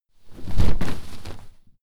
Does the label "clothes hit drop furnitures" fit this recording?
Yes